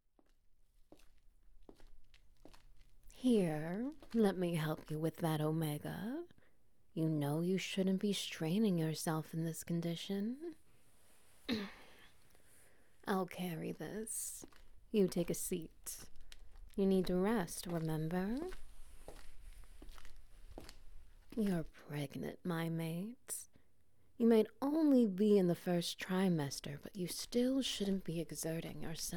Wet Noises

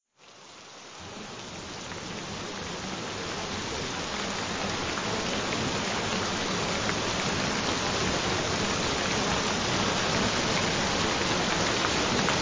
Relaxing Rain; Master Any Sport; Athletic Abilities; Flexibility; Stamina; Strength

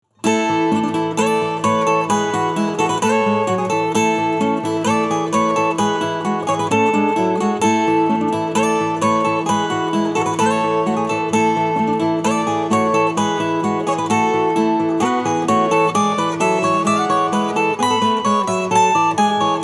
Acoustic; Vermillion City

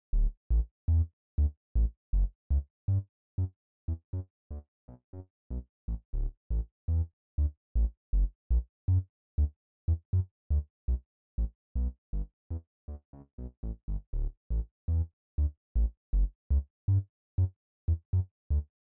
Sub Bass

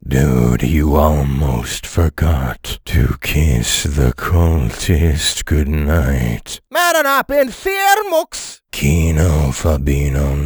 blood kiss

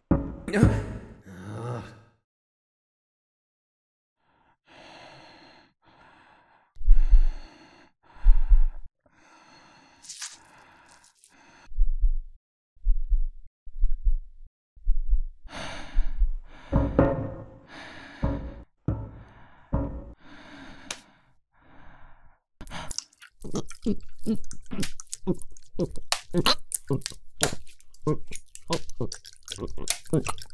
deep sound

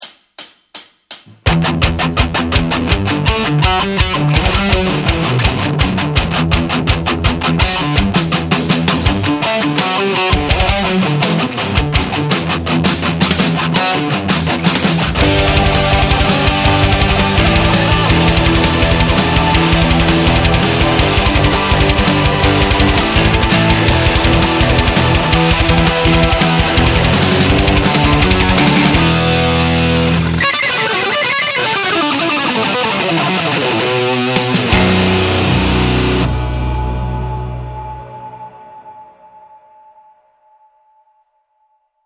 Metal Guitar

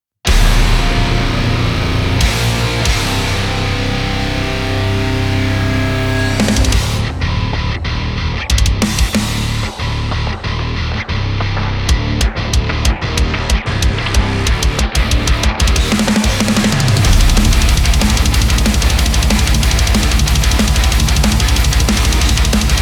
Instrumental

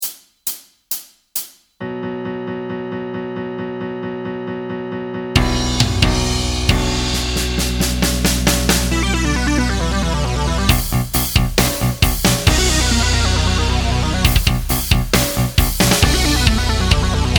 Common Tone Chaos Backing Track

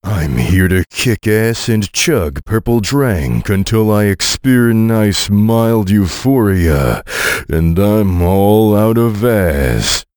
chug

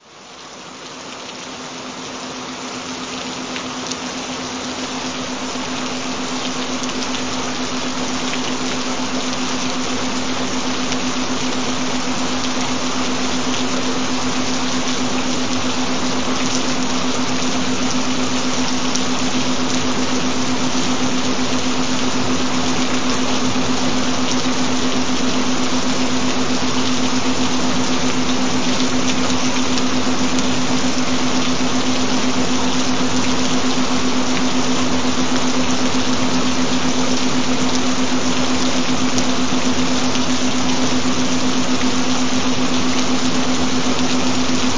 Gentle Rain Sounds; Look and Feel 23 Forever; Facelift; Facial Toner; Youth-Inducer

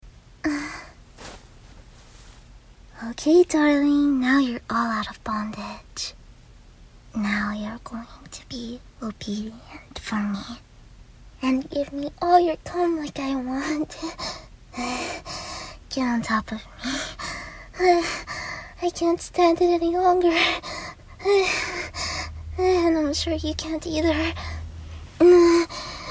white noise

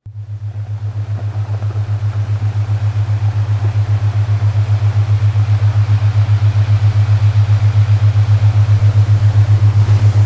Relaxing Waves; Stop Drinking Alcolhol Forever; Alcoholism Rife Frequency

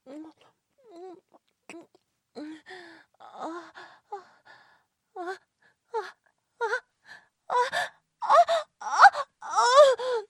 moans

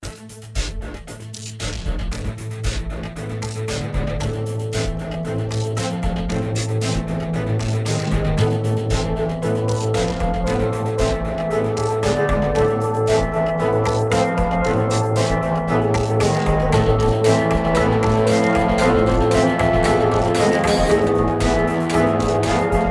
heavy

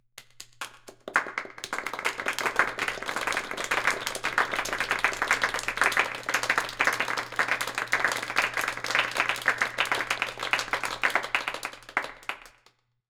applause

